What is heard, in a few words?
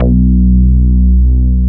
BS, Synth Bass, Analog Bass; Roland L-CD701; Synth Bass 2